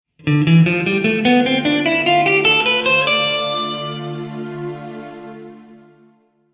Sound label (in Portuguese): Escala de D Dorico